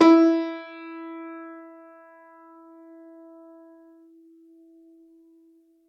Grand Piano, Kawai, Sustains, E3; Chordophones; Legacy

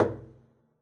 pizz noise high thump, double bass; dsmolken; noises

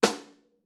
snare rim fff; Membranophones; Struck Membranophones; Legacy Snares

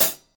Hat Closed Hardest; hydrogen drum samples

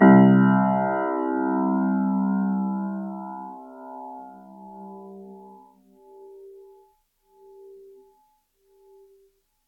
Grand Piano, Kawai, Sustains, C1; Chordophones; Legacy

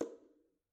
Bongo Hit Muted, Struck Membranophones

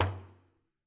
Hit Muted, Frame Drum; Membranophones; Struck Membranophones

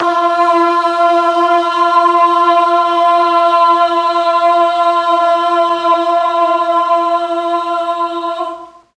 F2 BOYS CHOIR, mellotron

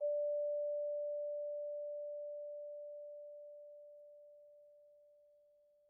Vibes soft D4, Vibraphone, Soft Mallets; Struck Idiophones

